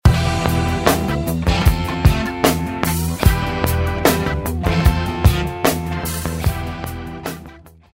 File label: phrygian funk